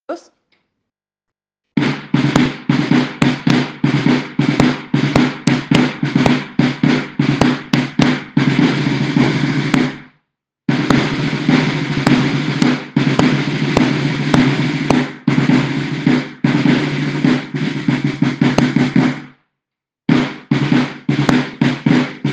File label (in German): Fussmarsch, trommler